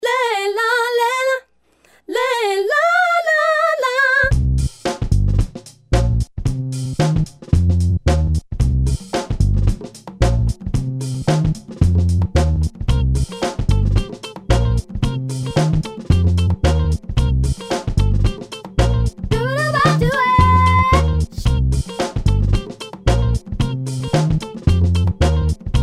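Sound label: instrumental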